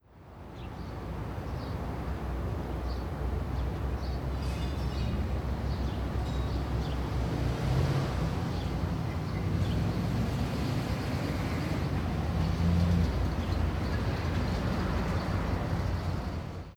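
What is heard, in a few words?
Dierkow Town ambience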